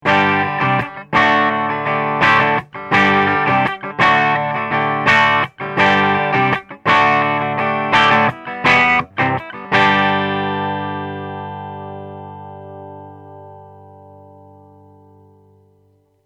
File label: 6V6 Clean